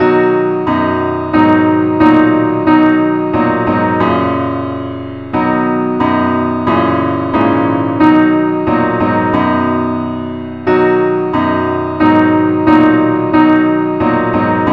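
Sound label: advance accompaniment key B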